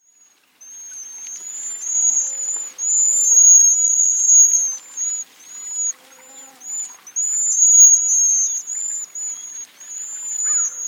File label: Cedar Waxwing